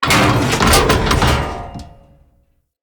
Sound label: Siege Engine Damage Impact; Weapons; Enemy; Artillery; Ode Siege Engine